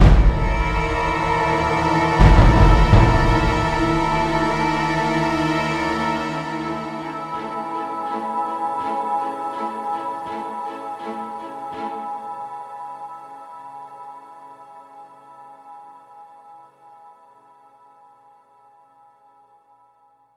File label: Music Stings Tunnel Dark to light